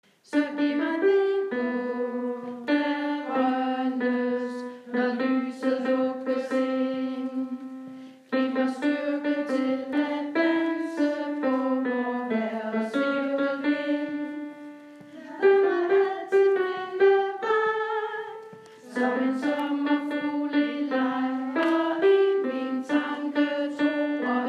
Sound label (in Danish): når håbet grønnes alt